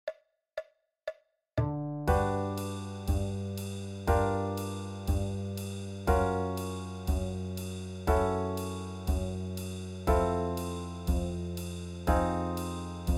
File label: play along no melody; intervalic